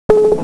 BLOOP

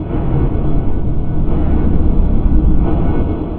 METAL

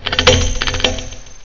chain

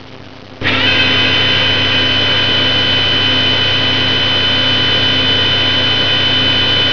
BAND; 999wavs

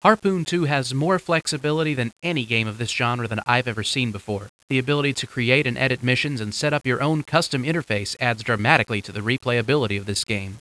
HARP

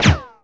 BOMB

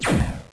LASER; FRAGILE

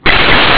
LAMB; ANIMALS

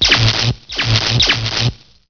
ELEC

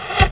BOW HIT; LORDS2

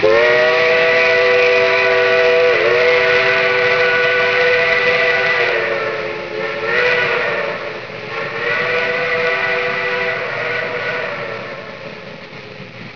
STEAM

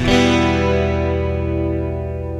GUITAR, ROCK POP; MAGIX

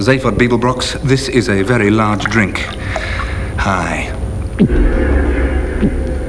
DRINK

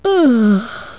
YAWN

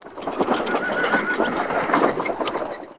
cavalry; TOAW

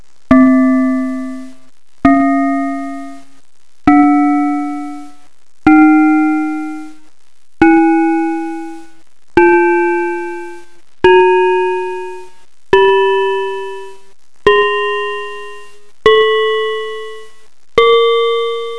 BELL NOTES

